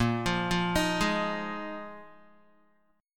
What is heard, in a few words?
A sharp 7th Suspended 4th down Guitar 4F 4A 5C 5G 5C 6E; A#7sus4; arpeggiated